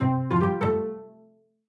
Strings, notifications; Honor 600 Pro China

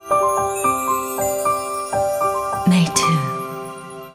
boot audio; Meitu; M2

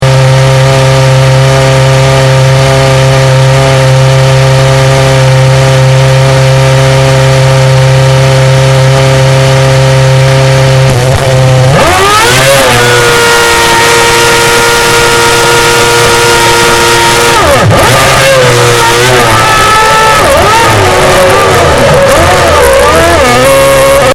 Start AntiStall; Red Bull MOBILE

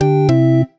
Electronic Sports Sound; ZTE Nubia Red Magic 8 Pro Plus

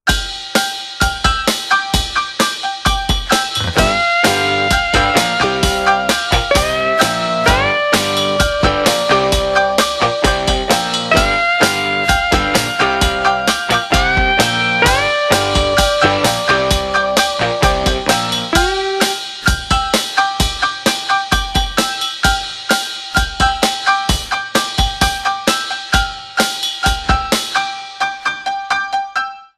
Ring Get Up; Microsoft; Windows Mobile ringtones; Matthew Bennett

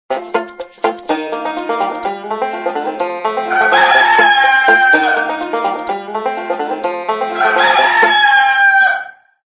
Rooster, Spring ringtones; Sony Ericsson

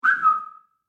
Whistle; Aio Wireless

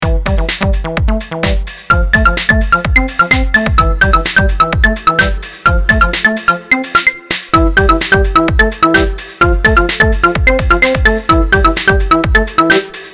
Candytoy, ringtones; Acer Liquid E1

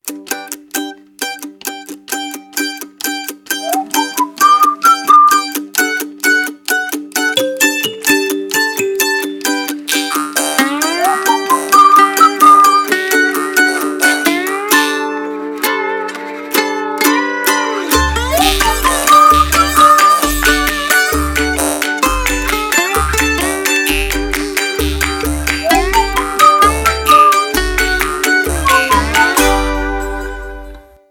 Little Ditty, ringtones

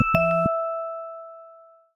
Ring, notifications; Motorola; Edge 70 Max China

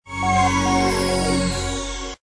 tone shutdown; Vivatel

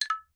Voice Record Fail; IUNI; ui; IUNI OS 3

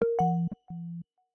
Woodblock Echo, notifications; Samsung Galaxy Z Fold 7; Sound Theme; Calm

